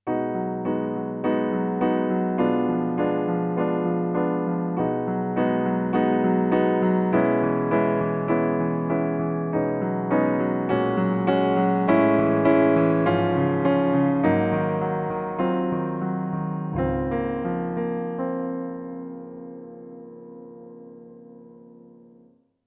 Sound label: karaoke